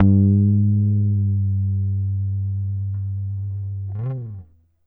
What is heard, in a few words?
GTR TRIK, Jazzistic; Univers Sons